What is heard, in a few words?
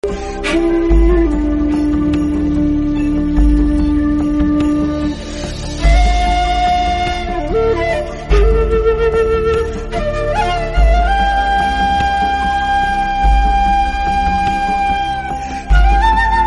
seruling, bgm